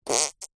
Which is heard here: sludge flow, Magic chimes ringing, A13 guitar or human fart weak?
human fart weak